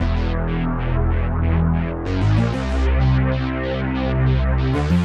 Slip Bass 95-E, horrorscape samples 95bpm

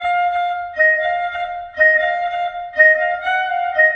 Clarinet Effect

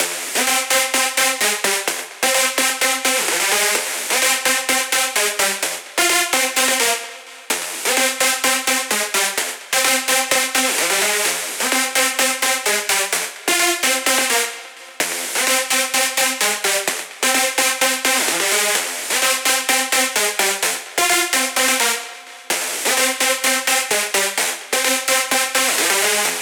128 BPM lead Synth Add Wet noSC, G; Vengeance; Essential Clubsounds Vol.5; Demo Constr Kits; Sidewalk Kit

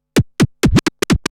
Scratch Fills 140BPM

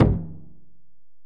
Ac Perc, Drum Machines Alesis DM-PRO

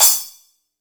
kit, Yamaha Motif-ES8

